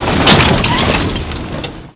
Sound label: Car Over Bump Thwack